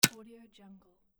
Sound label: metal impact on ice